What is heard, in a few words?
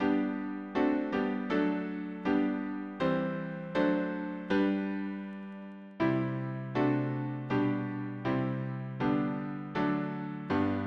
harmony piano